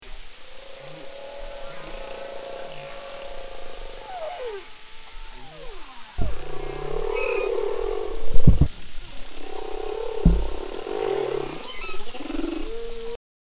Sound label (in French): mere et baleineau; baleineaux